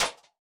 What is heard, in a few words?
Perc